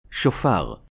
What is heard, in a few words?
Hebrew Shofar